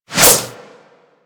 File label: Boomerang Whoosh; Warframe; Weapons